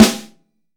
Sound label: BRASS SNARE; AKAI S6000 CD-ROM - Volume 3